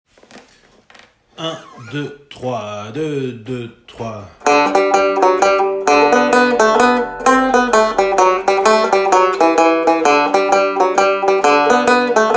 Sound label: Mazurka; d'Auvergne